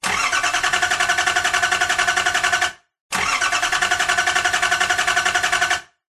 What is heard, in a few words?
vehicles car suv diesel false start front ext; nissan patrol